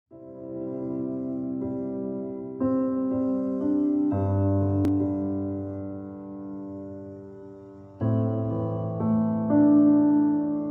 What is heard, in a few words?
Piano Relaxation, Spa